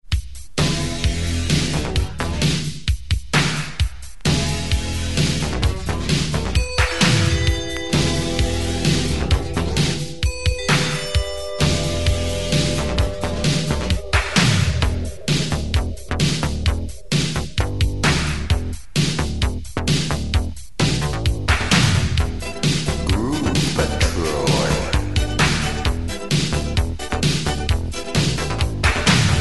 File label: groove